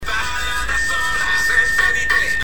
ballad a sola

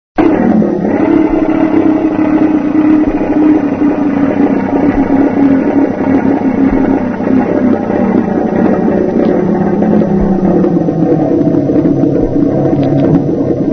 gracidatore; russolo; futurismo

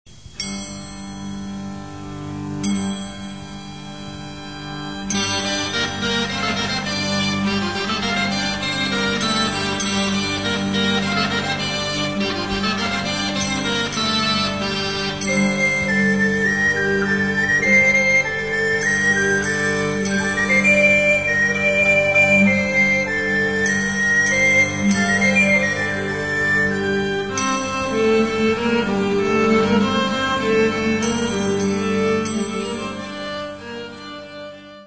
cimbali

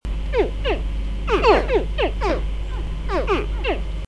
coccodrillo

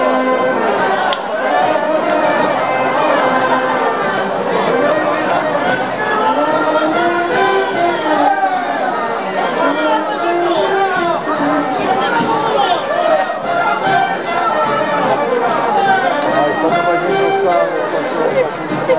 Musica; Ciminna; Triunfu

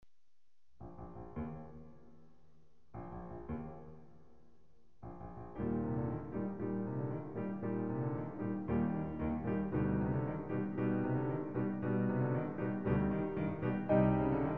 Marcia - Inno per pianoforte a 4 mani

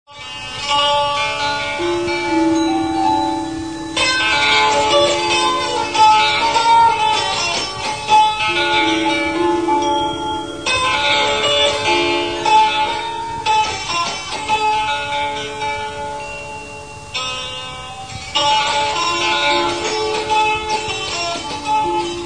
campanel

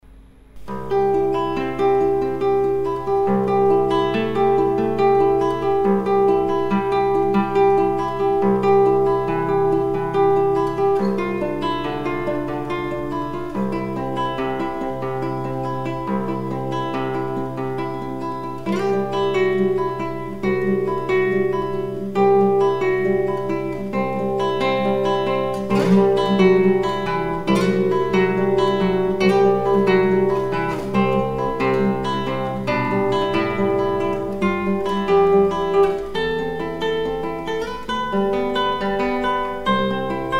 Gong tibetano

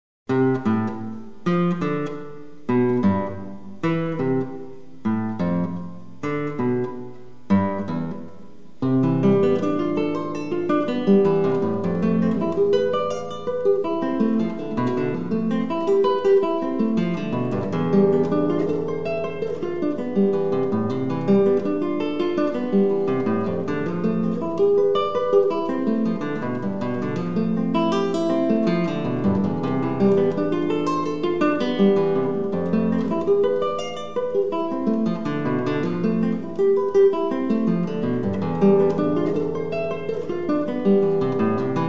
Arpeggio perpetuo

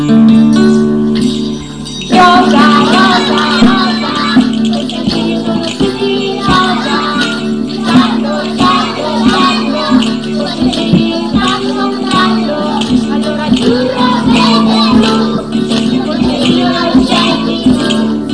canzone

